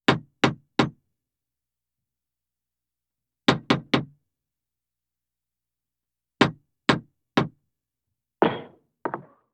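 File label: judge's gavel, auction hammer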